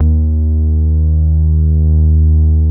BASSES

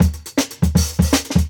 Jungle Breaks; Original Jungle Breaks

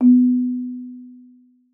marimba B3; Xsample VOL-4

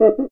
CUICA; ILIO

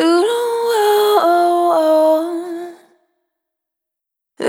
Spin, Single Voice Melodic Loops

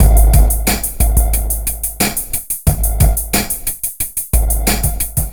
Noise Beat; Drums & Drones

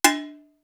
LAYR BELL; East West; Global Sounds; D4 FXS

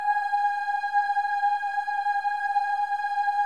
BOYS OOS; Symphony of Voices

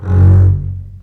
String Collection, MARCATO; F#1; East West - The Ultimate String Collection

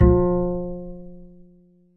DBL BASS F3

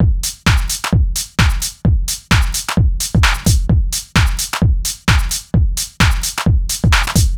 Solid Loop 130, Loungin House; Equipped Music

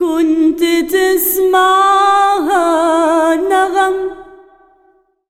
SINGER, Arabian Traditions

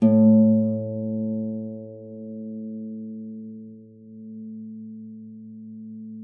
HARP G#2; MF; Xsample VOL-8

